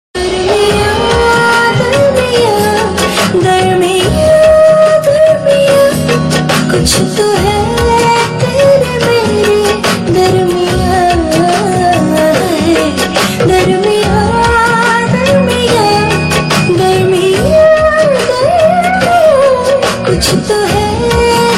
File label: Female Tune